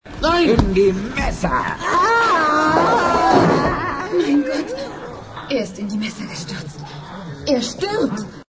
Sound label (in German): schrei